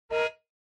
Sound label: Car Horn Fake